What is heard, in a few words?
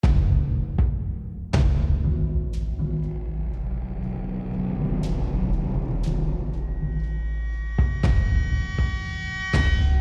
Tension High; the last of us